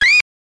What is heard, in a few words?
beep sound epic ending